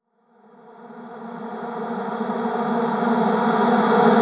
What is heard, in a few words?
reverse, vocal sample